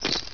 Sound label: bandit walk casual step left; gearbox